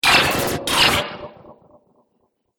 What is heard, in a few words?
Laser Future Droid Repair; Laser Sound Sound Effects; Sci-Fi Laser